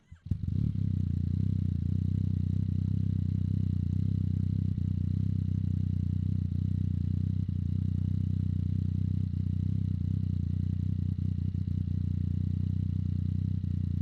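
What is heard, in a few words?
Yamaha MT09 Exterior Start Idle Blips Off; 2015; Holophone; Pole Position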